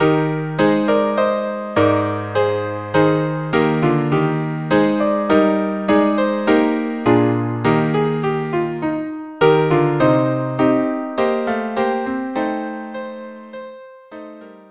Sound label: quartet; Telemann; Helden